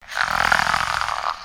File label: Pet Cat Snore